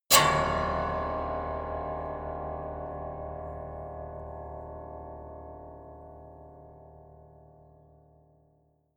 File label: piano squeal